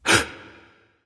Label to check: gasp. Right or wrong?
Right